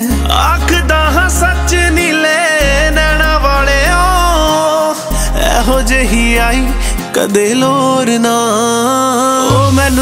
Punjabi Ringtone

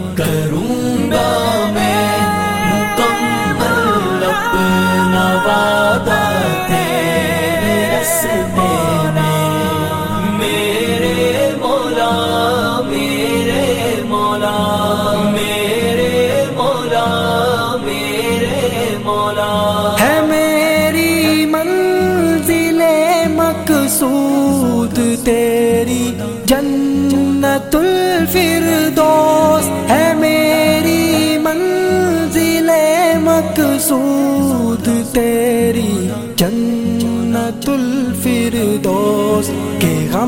Islamic Ringtone

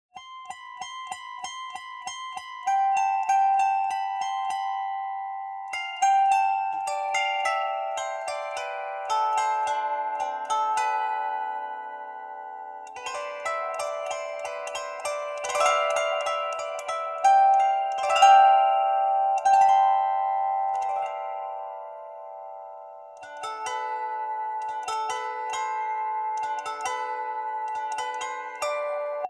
Lap Harp Tone

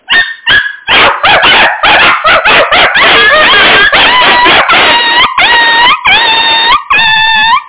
Puppy Voice Ringtone

